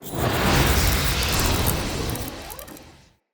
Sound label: Casting Device Charge Heavy; Weapons; Player; Fenn; Casting Devices